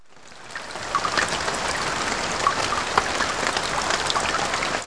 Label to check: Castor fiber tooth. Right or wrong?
Wrong